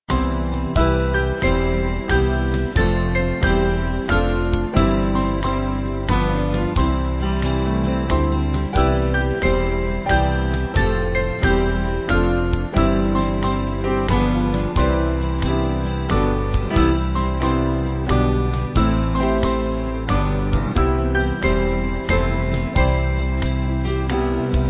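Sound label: Band, Free Music Backing Files for Churches; Brother James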